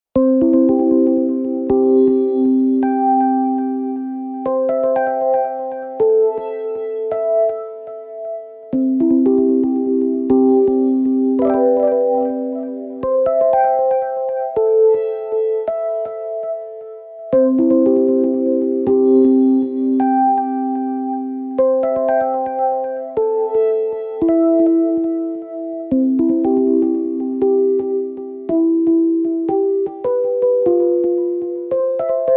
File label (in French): sonnerie android